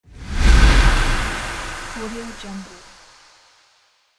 long soft transition

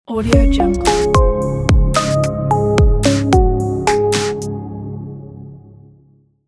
marketing logo

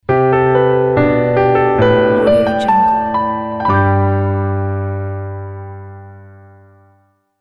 piano intro

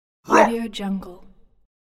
orc roar

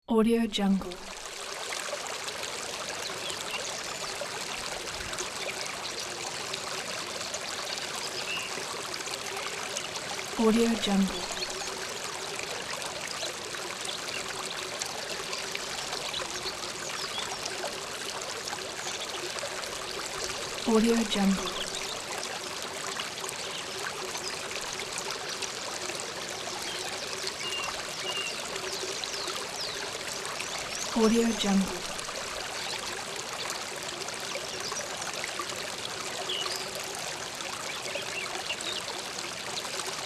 calm forest creek

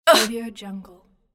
female voice grunt

